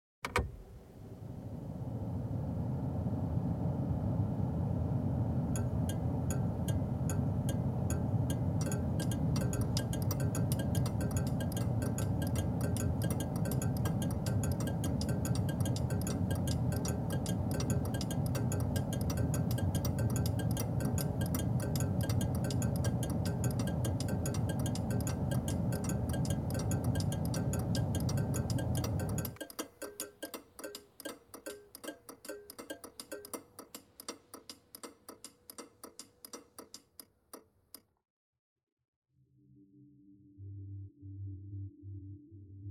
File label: Clickers Mash